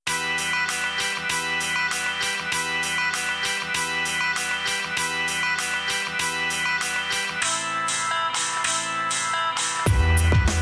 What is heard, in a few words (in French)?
générique fin court fermeture antenne